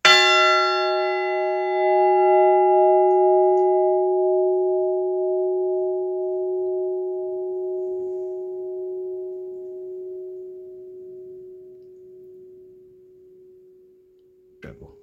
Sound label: bells of sussex; stoughton